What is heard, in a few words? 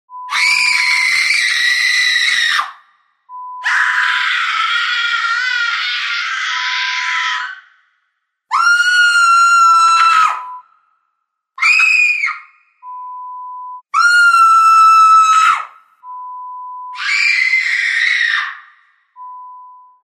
Scream Collection